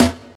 dry snare sample E key, snare sample tuned to the E note